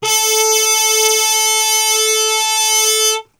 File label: A 2, Brass; Best Service; Brass Super Section; TRP HARMUTE; 90 s Sample CDs